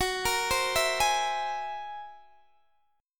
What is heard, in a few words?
F sharp 9th Flat 5th, Guitar, Open D; x,21,20,18,19,18